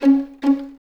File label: Violins C#4 Pizz; String Ensembles; Miroslav Vitous; 11 Violins